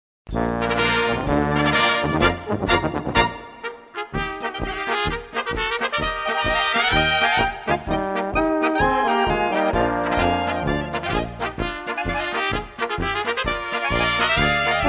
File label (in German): Blaskapelle Instrumental